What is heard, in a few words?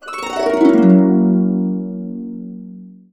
Gb, Harps; USB Soundscan vol.62 - Complete Harps; AKAI